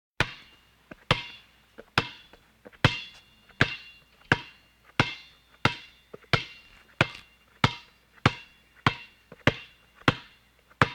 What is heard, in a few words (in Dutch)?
Dribbelen Met De Basketbal; KMP Film Video Sound Effects